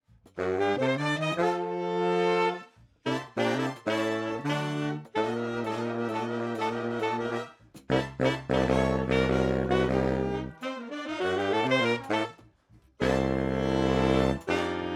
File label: Bari Sax; Neumann